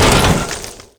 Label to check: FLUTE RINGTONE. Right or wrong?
Wrong